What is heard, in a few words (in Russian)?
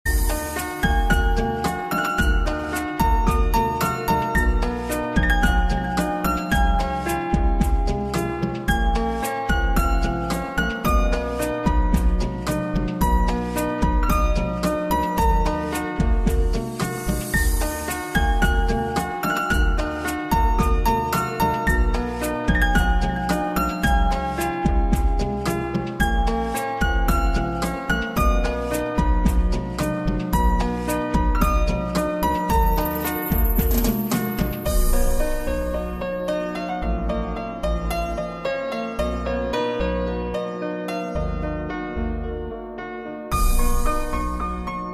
klassicheskaya muzyka i retro ringtony na telefon